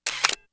Camera Shutter Basic; Samsung SGH-i300

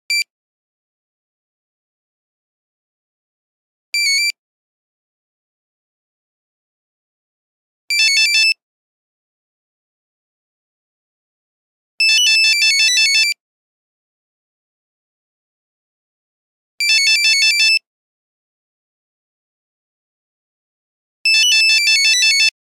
Nokia Buzzer; Windows Phone apps

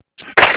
camera shutter; Sony Ericsson S700i

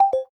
Key End, ui; LG Smart Folder X100S

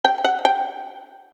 Email Pizzicato; Vertu Constellation Quest

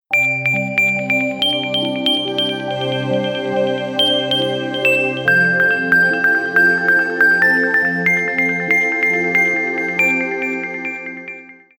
Sight, alarms; Alcatel; OneTouch-V985N-2AVDPT1

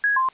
Sevice Change; ZTE C70-Essenze; alarm

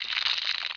mahjong shuffle usage, phonetones Nokia N900

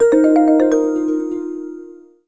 Mystic alert; Samsung SGH-i320

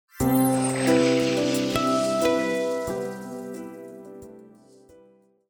boot audio; Explay; Rio-Play

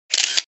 camera sound; Alcatel OneTouch S'Pop 4030D

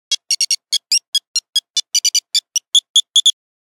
Tingle, Nokia Buzzer; Windows Phone apps

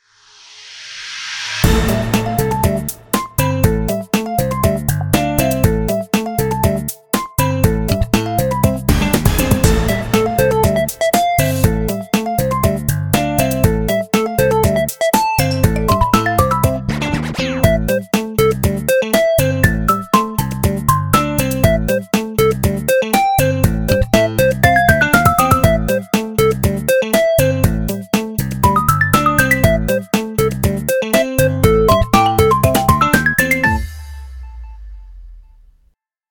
Electronica Funk, ringtones; Oppo Real R801

